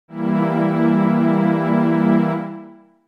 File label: E E Diminished